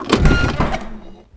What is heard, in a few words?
van door open; skate shop; Tony Hawk's Project 8; XBOX